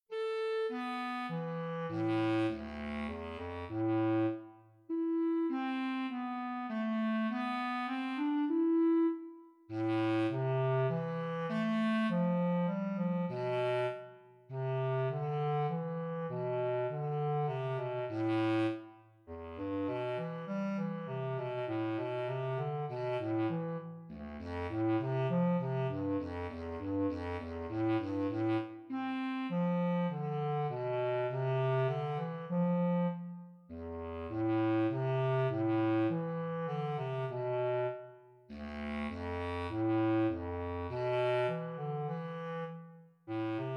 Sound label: Soliloquy for Bass Clarinet